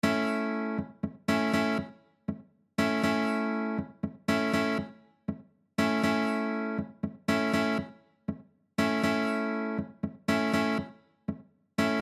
Rhythm, guitar

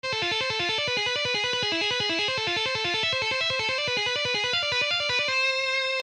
Shredding Exercise

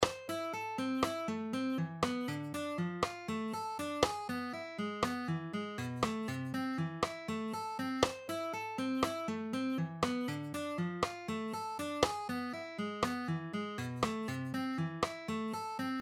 String Skipping Exercises Half Speed, guitar

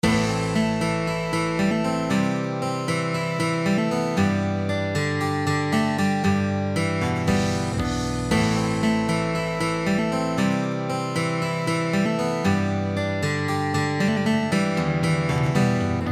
Plucking

